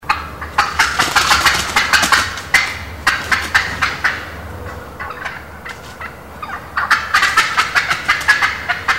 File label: cackle turkey call sound